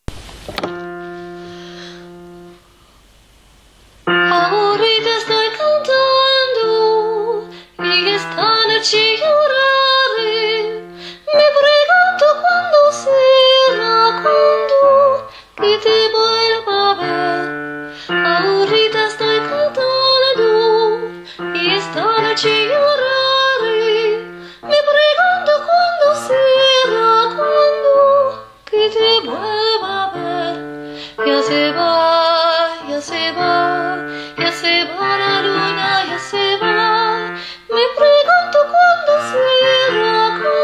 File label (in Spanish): soprani; Ya se va